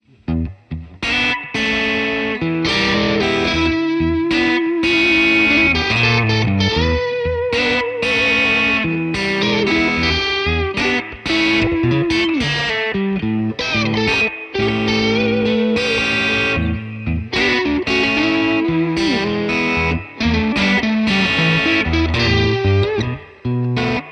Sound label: blues strat JCM800 hot; mercuriall